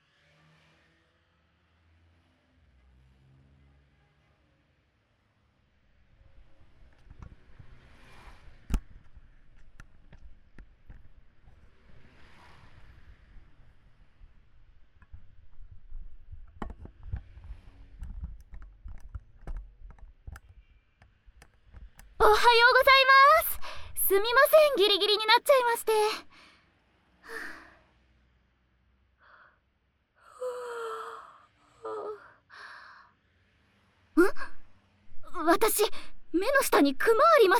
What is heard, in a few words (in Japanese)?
助手席ドライブ・寝息ASMR; 秘密のドライブ; 秘密のドライブ、しちゃいます？